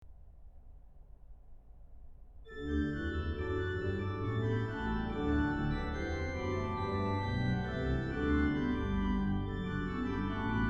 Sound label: Orgelbuechlein; BWV 638; Es ist das Heil uns kommen her; musique d'orgue classique